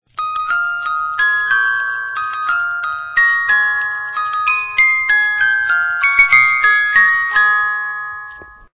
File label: Tinkle